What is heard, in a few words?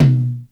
flippant, Toms; Real